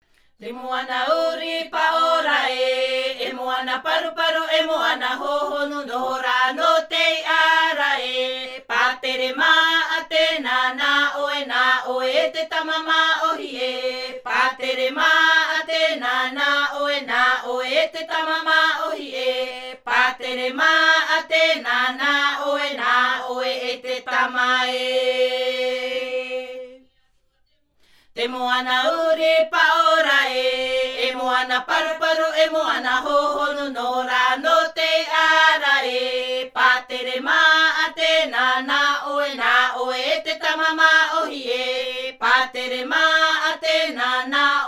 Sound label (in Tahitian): Himene tarava Raromatai